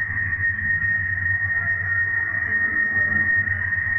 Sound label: Tonal Re Draw, sparse soundscape